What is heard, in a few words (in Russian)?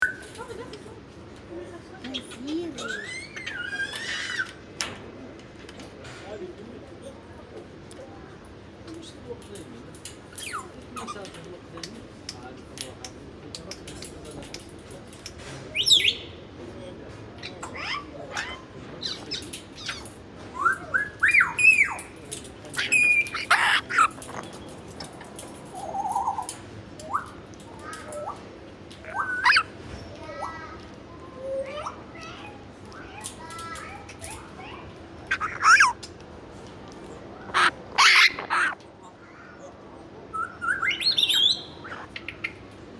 seryi popugai jako